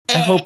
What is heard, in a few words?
disgusted sound